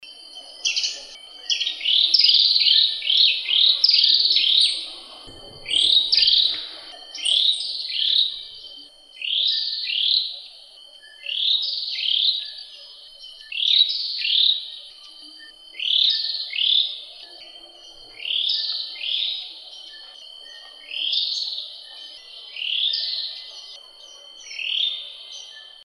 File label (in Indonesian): cinenen belukar